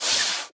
tool hover pad arm down

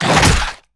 creature rock puncher chase

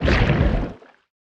creature spikey trap pulling

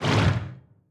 creature swim fast; bruteshark

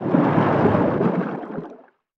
creature arctic ray swim fast

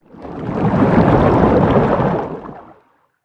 creature swim slow; chelicerate

